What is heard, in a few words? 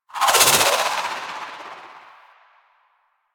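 Charged Projectile, Sidearm; Weapons; Warframe; Tenno; Wraith Sidearm; Epitaph Prime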